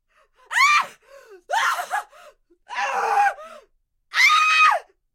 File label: dist scream; Zomboid 41.78.16; Project Zomboid